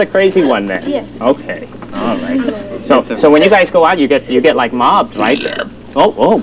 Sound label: burp